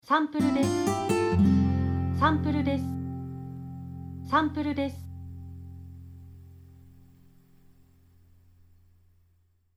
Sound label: Guitar